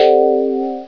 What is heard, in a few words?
WARNING